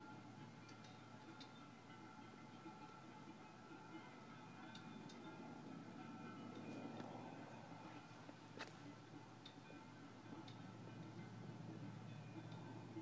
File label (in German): Kühe Glocken